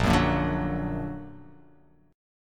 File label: Bb Augmented Bb; strummed; JGuitar